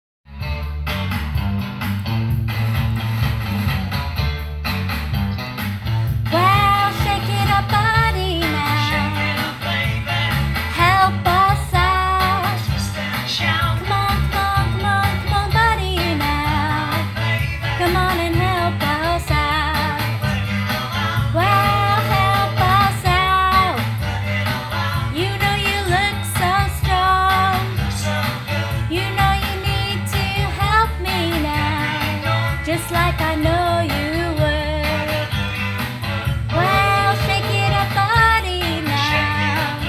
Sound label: singalong